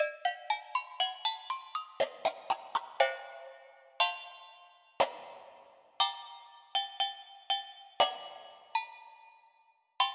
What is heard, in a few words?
Agogo Bell